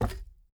ui drop wood; Rust

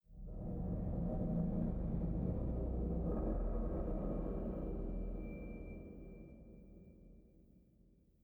sting metal groan; Rust

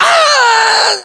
death

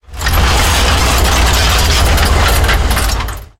turn rattle, tanks; War Thunder

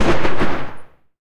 12mm tails close, guns tanks

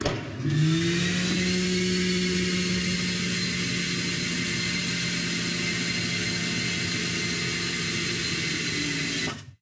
Aircrafts A-10 Canopy Open; DCS

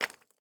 phys impact charcol; Rust

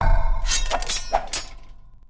Build Explorer; Civ4 warlords

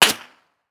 bullet flyby pistol; Rust

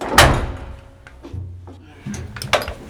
Vehicle reloads; Red Orchestra: Ostfront 41-45

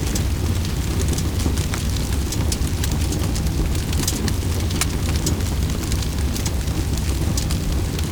fire car

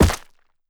rock strike; Rust

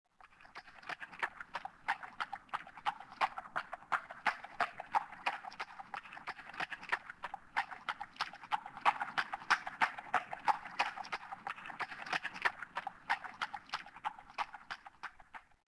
Horse Cobblestones; Ambient; Civ4 beyond sword

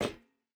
footstep rubber boots metal run; Rust

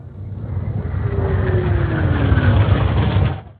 Artillery; Red Orchestra 41-45

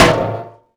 iron impact

